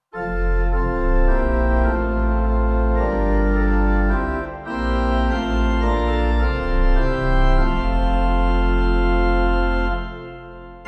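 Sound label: pipe organ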